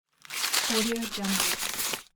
paper crumple

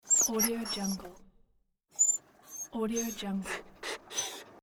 dog whining

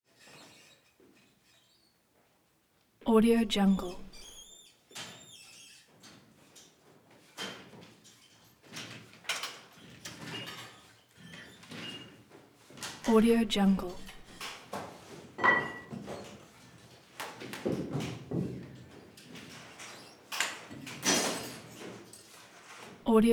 physical therapy gym metallic weight squeaks

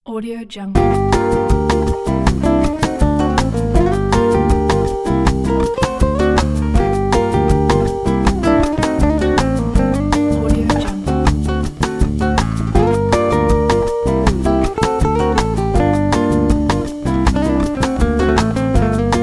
bossa nova loop

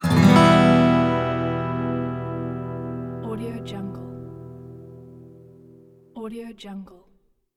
minor guitar chord

